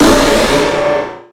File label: pokemon cry